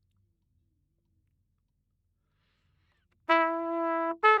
Trumpet

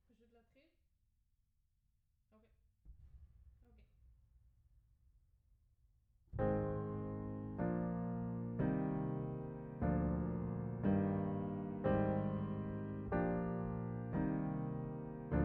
Piano Mono

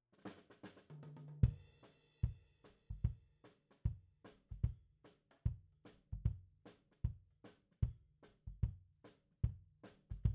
Kick out, Ragtime

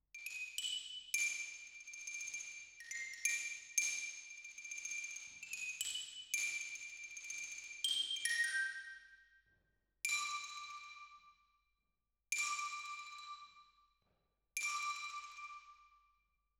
Xylo

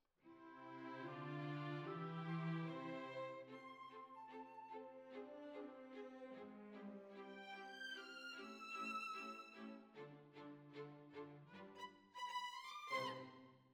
Violins Over

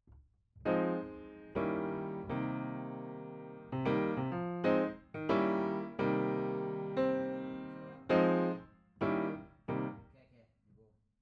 Piano B

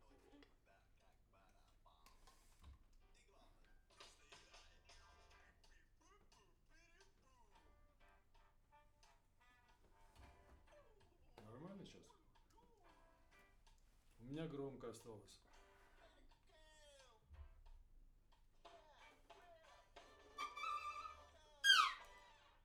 Main Strings, RNR for piano

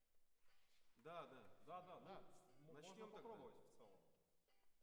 ORTF SCHOEPS, piano

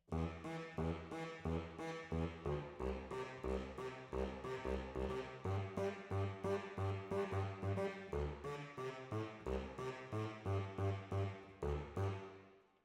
Alto, RNR for piano

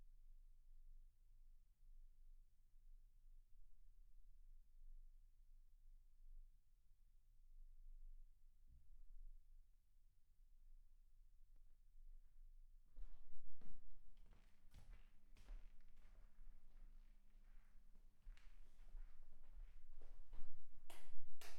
MAIN VOC

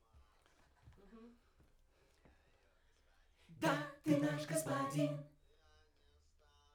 Backs SCHOEPS

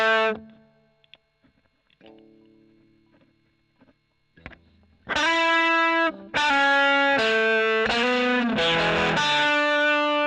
E. GTR Gefell OD

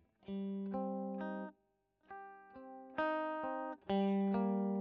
E.GTR Royer

